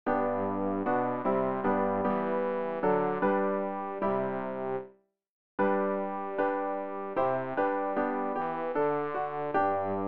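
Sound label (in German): bass, Übehilfen; RG 020: Ich erhebe mein Gemüte; Ref. Gesangbuch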